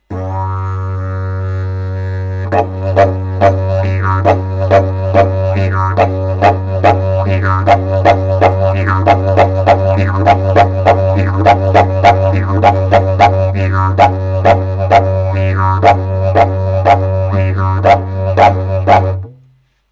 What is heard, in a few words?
tomtom cris